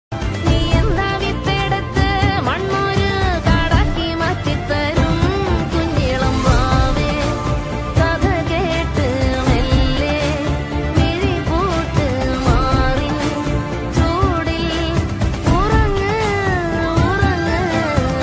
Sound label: ringtone